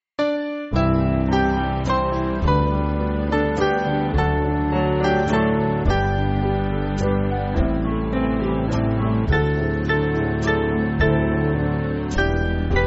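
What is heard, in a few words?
refrain Band, Backing Files for Churches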